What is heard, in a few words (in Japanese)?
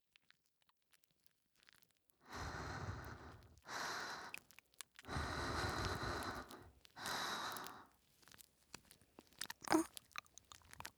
耳舐め（ハード）